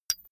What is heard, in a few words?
metal impact tiny toppy; hits explosionen; metall